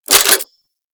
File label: Spin C; Warframe; Weapons; Zylok Prime